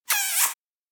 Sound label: whistle kiss; anemoi